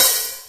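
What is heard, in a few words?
DR550 Hat open, Boss DR-550 Drum Machines; 300 Drum Machines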